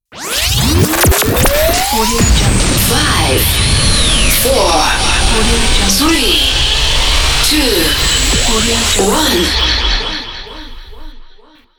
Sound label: countdown